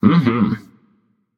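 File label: nonverbal agree; smite; voicepack; merlin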